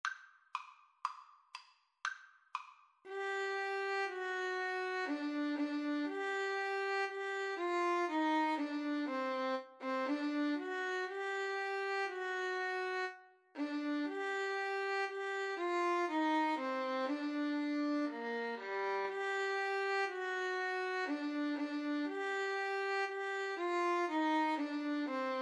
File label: gavotte violin